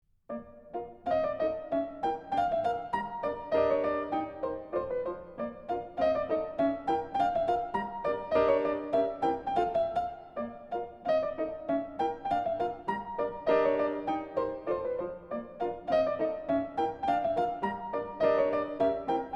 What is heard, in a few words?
Polka